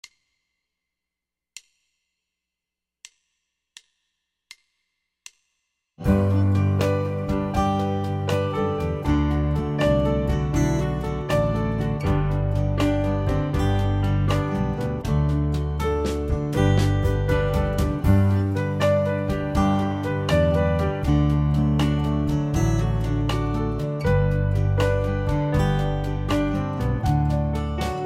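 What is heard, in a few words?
Irish Jig 80, harmonica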